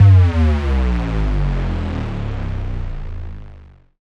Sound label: Bass Drop; SoundsCrate